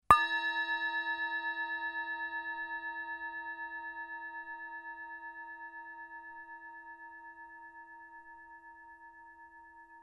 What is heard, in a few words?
bowl tibetan strike